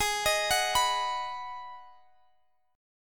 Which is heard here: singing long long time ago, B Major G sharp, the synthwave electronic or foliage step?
B Major G sharp